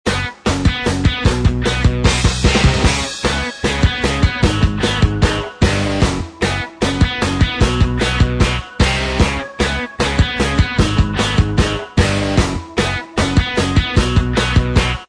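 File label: Instrumental Track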